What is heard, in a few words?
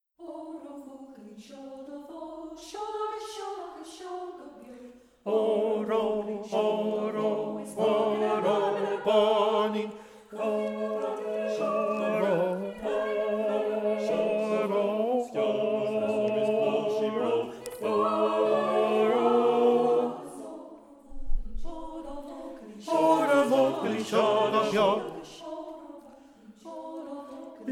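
Oro Bhuachaillin seol do Bho Tenor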